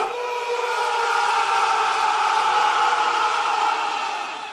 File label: uraaaa